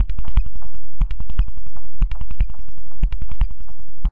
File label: ekoing panning tapping, looping ambience